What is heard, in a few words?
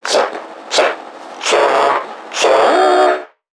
Creatures Vocalisations Infected; NPC; Five Nights At Akers; soma; npc construct